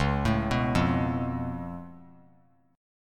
C sharp Augmented 7th C sharp down, chord; C#+7; arpeggiated; JGuitar

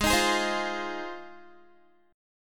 G sharp 6th, Guitar; G#6; strummed; 16,18,18,17,17,x

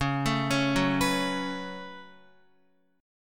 Db 7th Suspended 4th Sharp 5th Db down Guitar; JGuitar

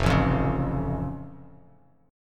F Augmented, Bass Standard; strummed

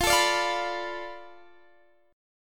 F 6th Flat 5th F down Guitar DADGAD, chord

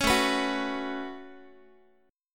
A 6th Add 9th A down Guitar; A6add9 strummed; 16,17,14,16,x,x

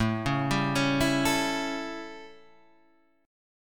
A 7th A, Guitar Drop D

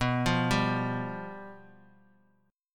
F Suspended 2nd Flat 5th B

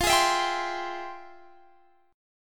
F Minor Major 9th, Guitar Open D, chord

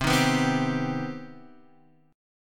C Minor Major 7th C Guitar Standard; strummed; JGuitar

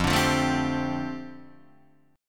F 6th Add 9th F down Guitar Open D, chord

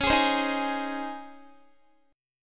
Db Major 7th Suspended 2nd, Guitar; 4E,4A,5D,5G,5C,6F; strummed; chord shape

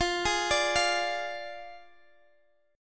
D Diminished F

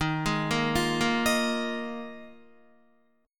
Eb Suspended 2nd Suspended 4th, Guitar; Ebsus2sus4 arpeggiated; 10,11,10,10,10,11; JGuitar

